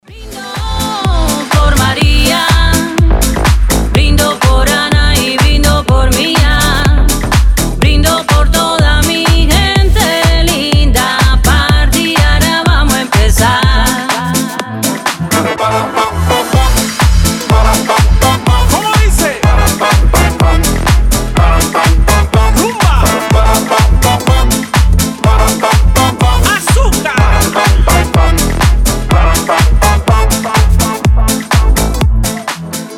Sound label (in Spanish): la rumba